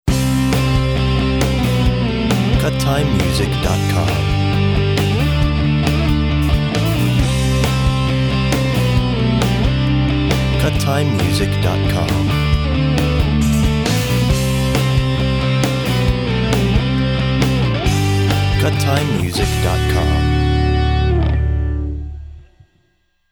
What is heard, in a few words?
Rock Theme